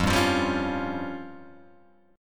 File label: F Suspended 2nd Sharp 5th F down Guitar Open G